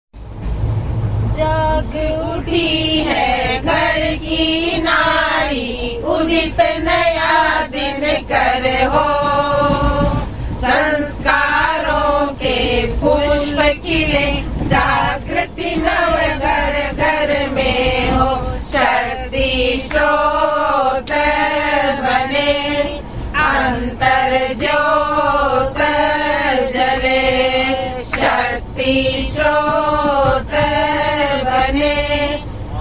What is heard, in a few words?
women singing feminist song on the train, India; WFMU